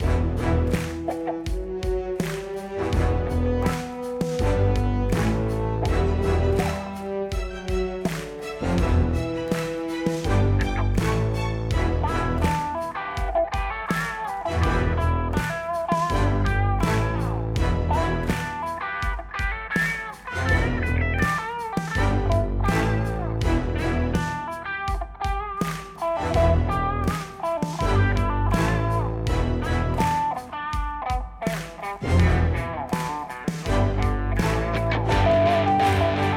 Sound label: theme loop; comix